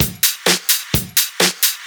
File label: Straight Loop 128 BPM, House